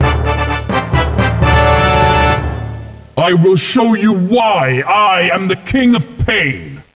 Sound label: pain